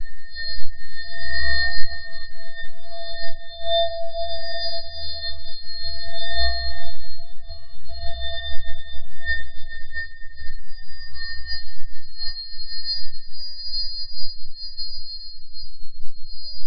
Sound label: ambience